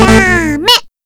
section fail; Beach LoliJemson

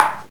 normal hitwhistle

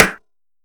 normal hit normal

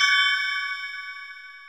spinner bonus; Beach LoliJemson

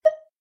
menu hover; Stargvze v2

